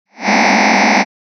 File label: cluster closed quarter E